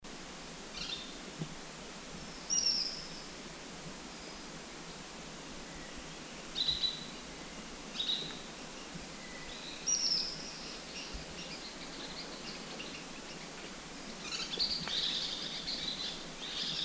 Black Chinned Yuhina Ssp unknown Group Call, Guangxi Da Yao Shan; yuhina a menton noir yuhina nigrimenta